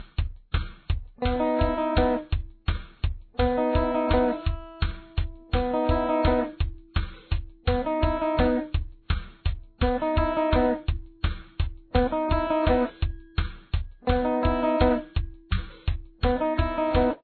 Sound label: verse guitar